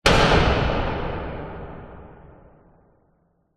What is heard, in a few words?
sound design cinematic hit hard loud metallic reverb; zapsplat